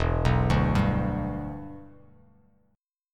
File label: E Minor 7th Bass Standard; arpeggiated